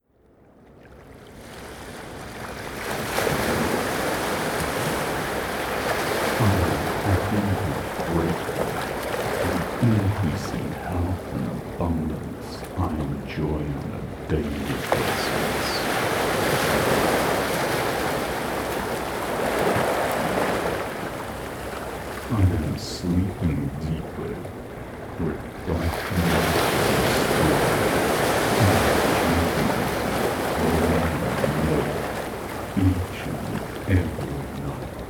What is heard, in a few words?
Nature Ocean waves Beta to Alpha Binaural Beats; This version is for headphone use.; Pure Liminal